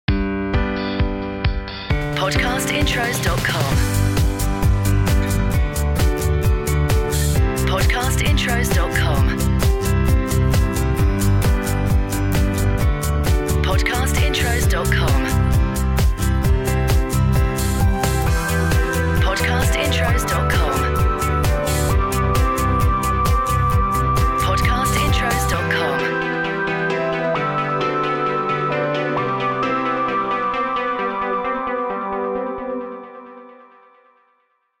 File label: CORPORATE Moving Up; Podcast Watermark